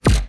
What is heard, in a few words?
granulized, kick